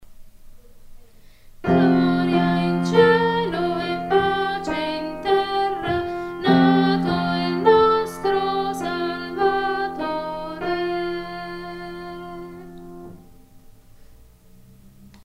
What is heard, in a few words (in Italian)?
Gloria In Cielo Pace In Terra Contralti